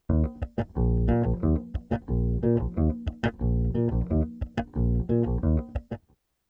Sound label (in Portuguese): Baixo Vaneira Loops